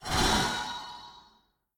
projectile; Smite; Tiamat

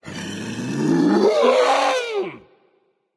battlecry berserker; cuchulainn

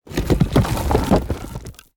roll rubble; smite; god geb skin7

